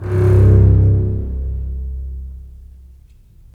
STR, STR Full Short; Roland - LA Composer vol. 4

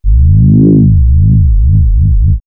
Wobble, synth fx; StarTrek; X-Static Goldmine

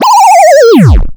Scratching FX; Vengeance - Essential Clubsounds Vol.4; Effects

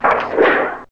METAL, PRC METAL & WOOD; Roland - LA Composer vol. 1

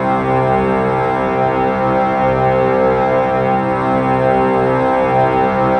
GRIND PIPE, Pads; Distored Reality 1&2

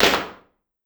Clap, Drum Machines DDrum-AT Pitched Down; 300 Drum Machines; Clavia

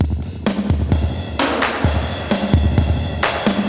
Spring Verb HR-16B (130BPM), cyborg beats

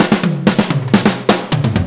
Fills 128BPM, Clubsounds; Vengeance - Essential Clubsounds Vol.5

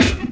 Snare, Percussion Hits, Snares

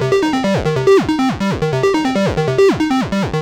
Arp 140bpm C, Trance; Arps; Zero-G Trance Inducer; 90 s Sample CDs

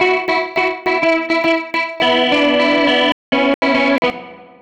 Gretsch Guitar Loop

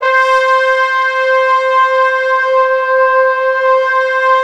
C4, Orchestral Brass; Best Service ProSamples vol.20; AKAI

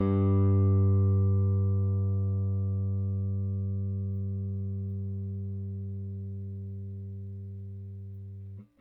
Rick Thumb A, Rickenbacker Bass; Airwindows

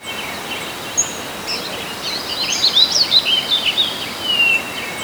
birds

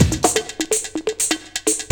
125 Loop

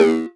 Toms, Elektron MachineDrum; SPS1 MKII

